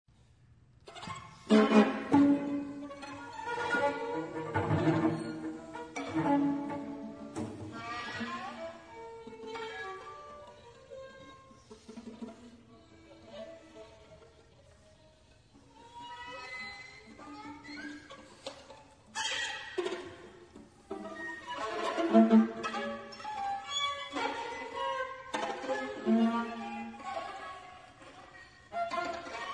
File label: string quartet excerpt